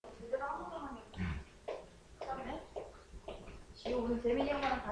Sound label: owl